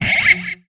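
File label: Music Guitar Pick Scrape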